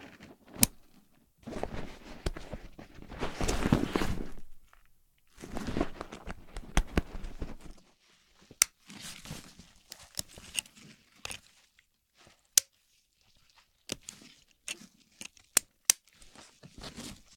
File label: Buckle Snap and Unsnap; Zippers, Buckles & Clothing